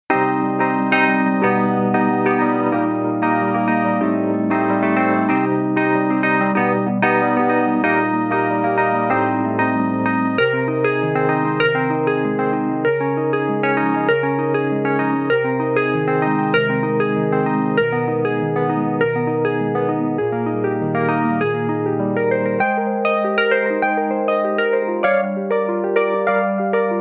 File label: Nord Grand Wurlitzer customized